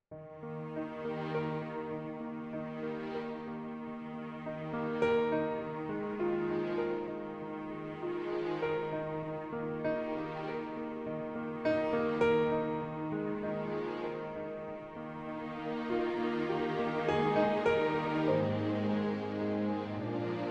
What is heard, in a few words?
Music Only